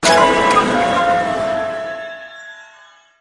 Click; Leisure

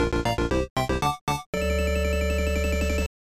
Level Clear; super bomberman 4

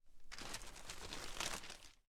News Page Turn

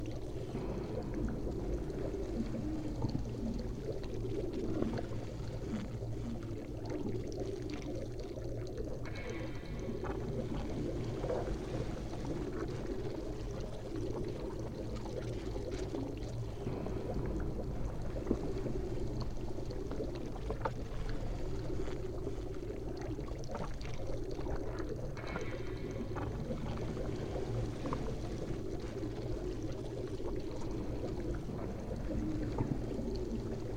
Ambience Inside Worm; Angry Birds Star Wars; android game music

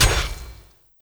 Attack Sword; endless world idle rpg gamerip 2019

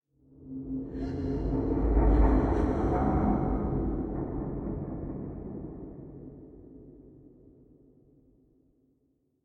Eerie Distant

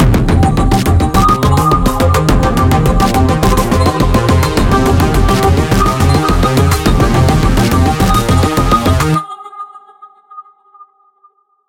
Fight Low Combo; Remember Me

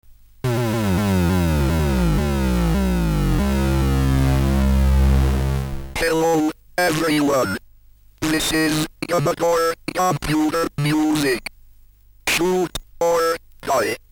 music, commodore 64; Input 64 a collection of Commodore 64; turbo outrun(2000)

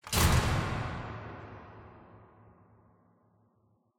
lights turn on

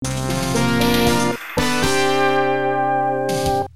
Fanfare; G-LOC Air Battle; R360 G-LOC; arcade; HIRO

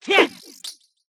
chain pipe; Mystical Ninja Starring Goemon

